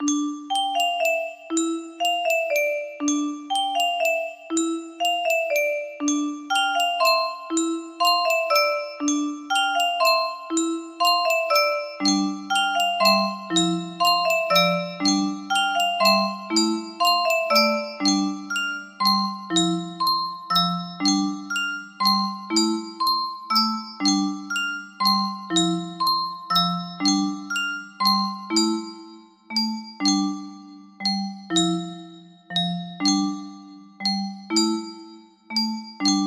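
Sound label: music box melody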